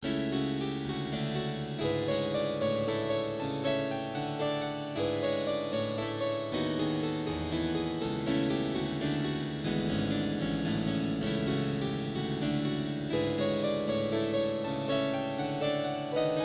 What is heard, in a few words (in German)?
Sechsachtel impro